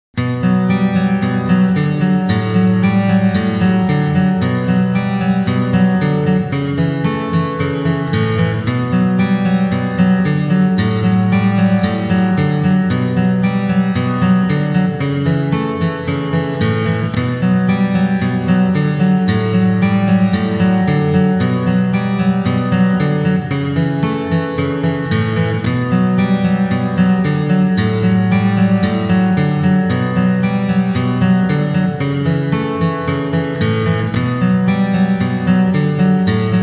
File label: Acoustic Left